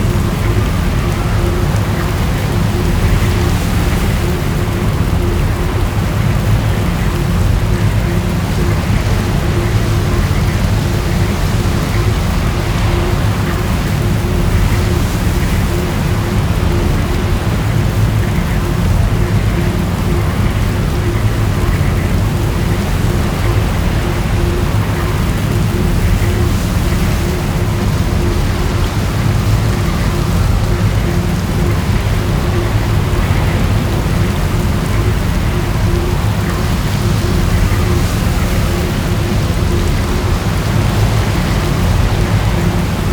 Crimson Ambience; OuterWildsModManager; OWML; AnonymousStrangerOW.TheStrangerTheyAre

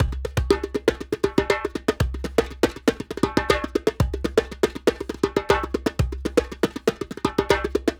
Djembe 120 bpm; Loops; Drums; Percussion; Ableton Live 12 Lite; Core Library

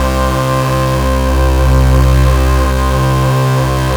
Synth Bass; Ableton Live 12 Lite; Core Library

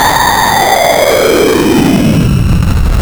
Noise Bitter; Ableton Live 12 Lite; Vector; Sprites

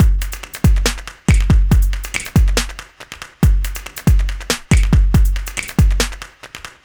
Dubstep Claps 70 bpm, drums; Loops; Ableton Live 12 Lite; Core Library

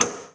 menu; Digital Paintball 2

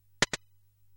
CPU Alert; MMX

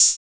Hihat Open

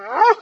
whine, dog; There; ThereClient

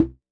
Tom Mid; One Shots; Drums

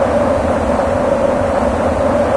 bacio pitch; There; ThereClient

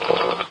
drink sip fizz; There; ThereClient

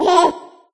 clucky laugh; There; ThereClient

